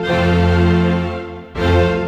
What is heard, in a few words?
Strings; Sonic Foundry (Sony Creative Software); Orchestral VOL-2; Modern 31